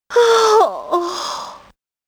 yawn; chun li

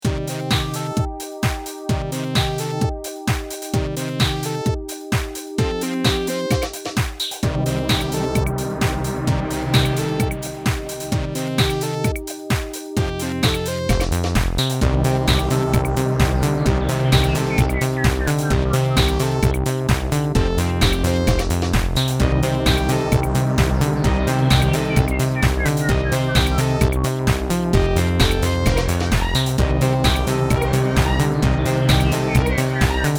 ringtone dance

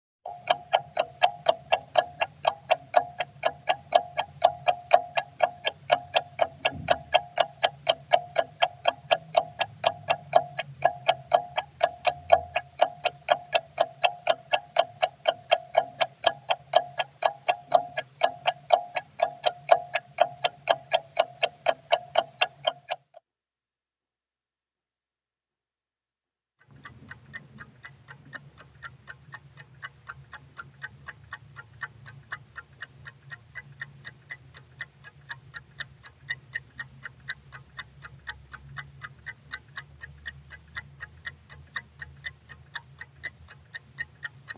clock ticking

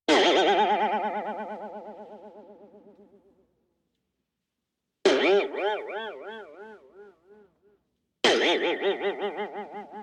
spring

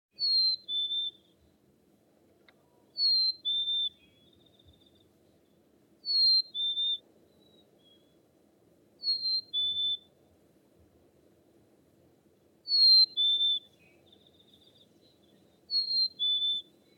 chirp

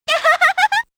laugh

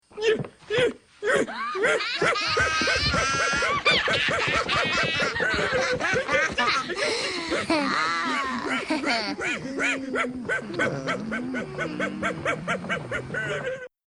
laughing; the simpsons

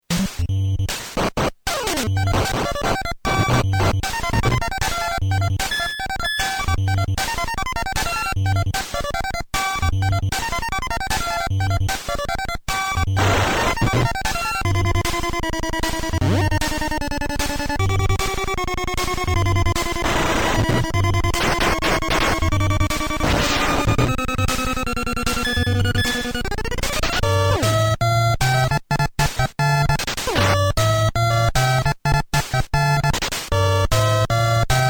stage 5; double dragon

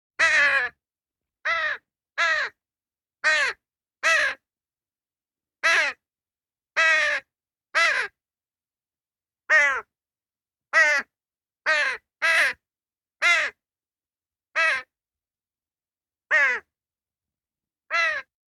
crow

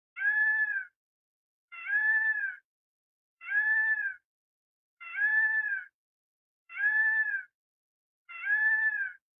bird

